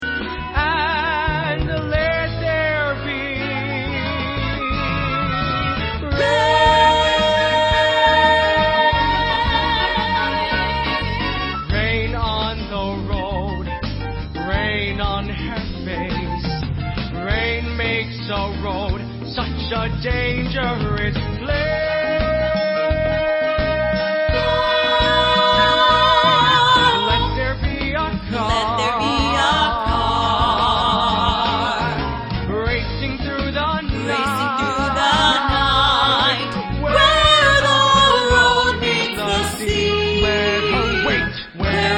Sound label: Rain Alto Boys